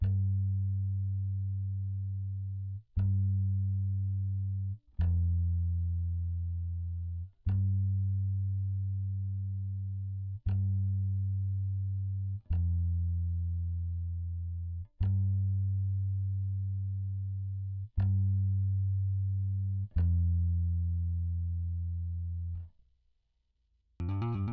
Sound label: bass